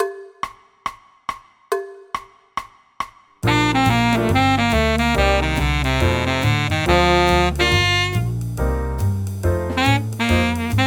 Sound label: Jazz Baritone Saxophone